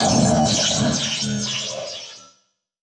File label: Production Element Title Transition Data Transmission Communication